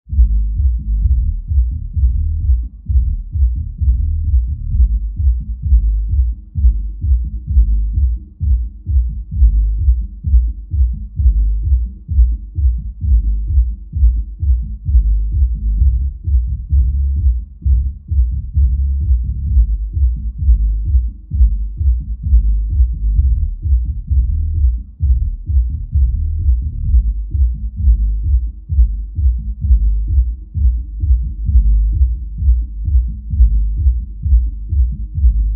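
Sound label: loud music at the neighbours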